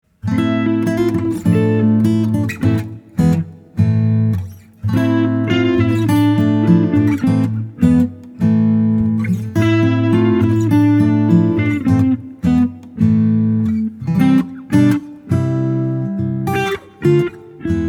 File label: Dobru Noc Karaoke